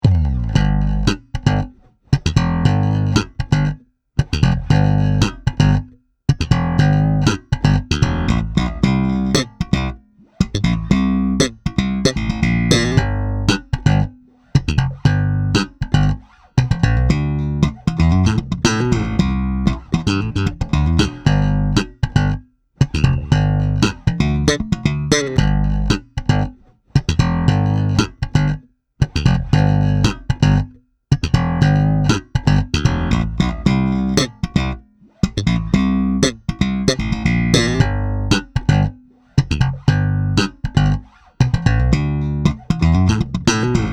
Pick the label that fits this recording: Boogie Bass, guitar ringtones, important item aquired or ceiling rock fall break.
Boogie Bass